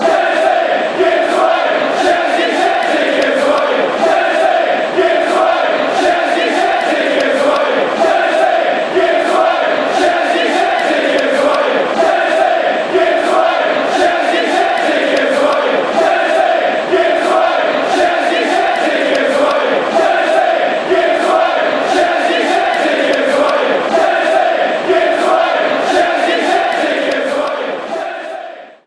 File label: give us wave, fanchants; szczesny